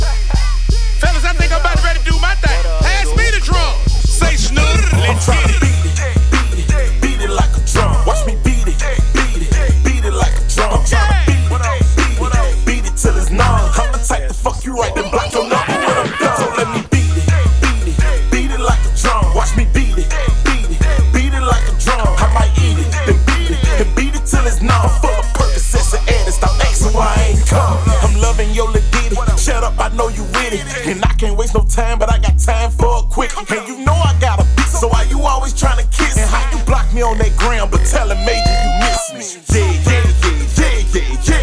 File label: Beat It Dirty